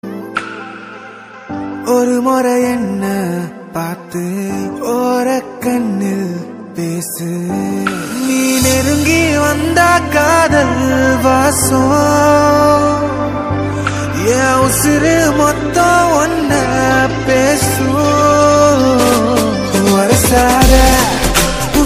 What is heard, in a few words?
tamil heart touch ringtone